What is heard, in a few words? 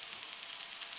Background Noise Clips